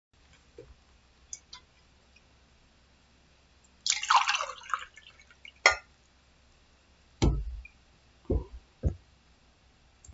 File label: Pour Sound